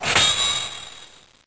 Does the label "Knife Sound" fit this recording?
Yes